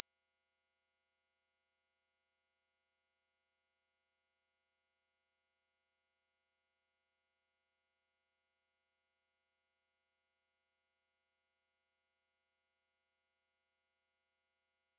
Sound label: inter sample peak test